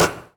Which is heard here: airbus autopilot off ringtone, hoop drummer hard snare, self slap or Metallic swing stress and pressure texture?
hoop drummer hard snare